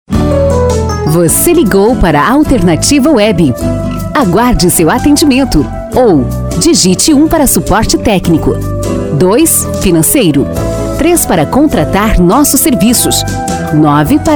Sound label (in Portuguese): Espera com trilha; Alternativa Web